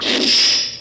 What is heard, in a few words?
throw